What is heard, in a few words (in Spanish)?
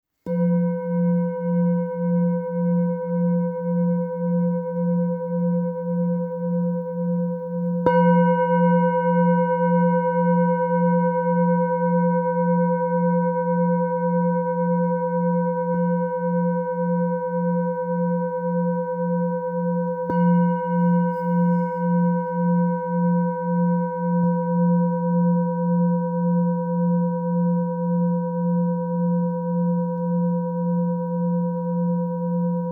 Fa 174 solfeggio, cuencos tibetanos